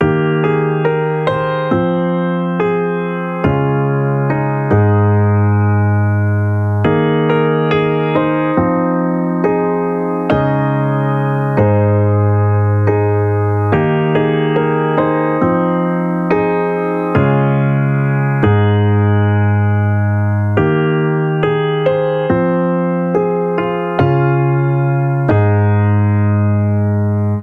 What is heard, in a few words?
Peaceful Piano Intro Zen AbMaj (FMin) 140 BPM; ultimate vibes midi pack 2